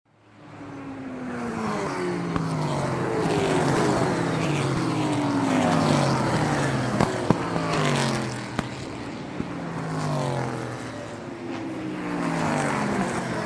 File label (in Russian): Проезд спортивных машин Travel of sports cars; Travel of sports cars вариант 2